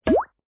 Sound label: click; superChef